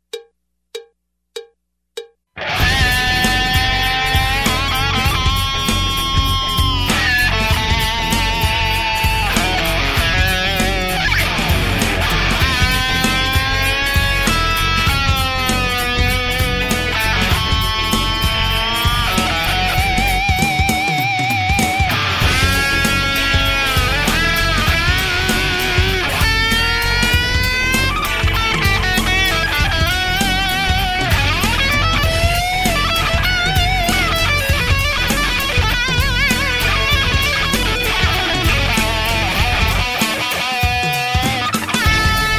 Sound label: Axefx Jams